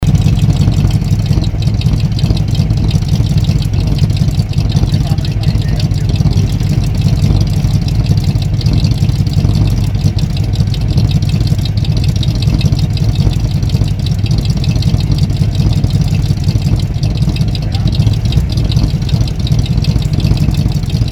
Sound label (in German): GAZ-66 Motorsound V8 Standgas